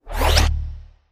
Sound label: space tile flip